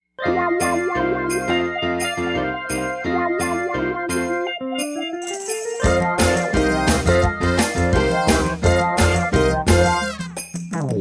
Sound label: JINGLE BELLS